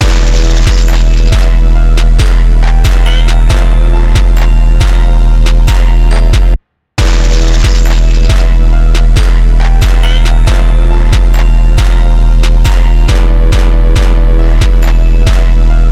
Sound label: Instrumental Super Slowed